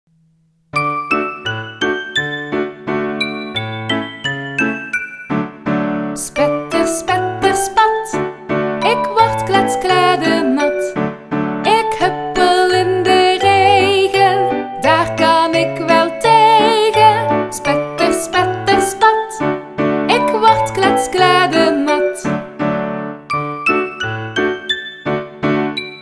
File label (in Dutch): met zang